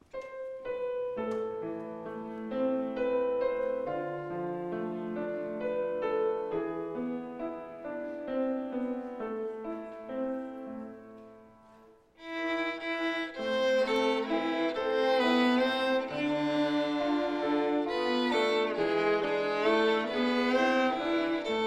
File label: Instrumental